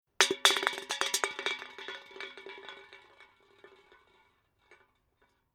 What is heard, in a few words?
Litter Trash Empty Coke Drinks Can Dropped And Rolls Right Metal Rattle